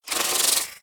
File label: Spider Aggro; The Inn